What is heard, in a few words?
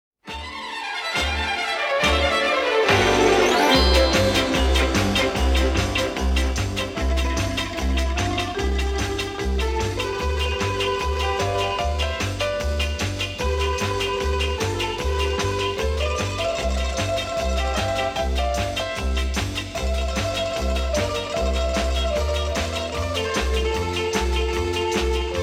Orchestra and Choir